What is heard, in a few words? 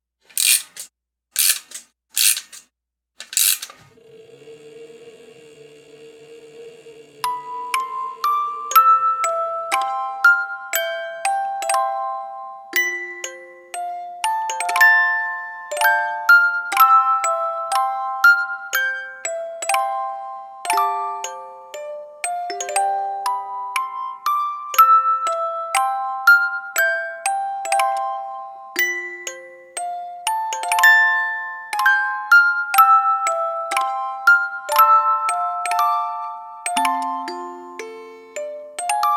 Orgel; gekiban